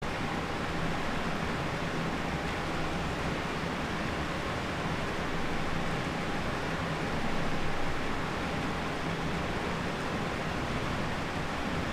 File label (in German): Schiffsgeraeusche; helmholtz200; Challenge200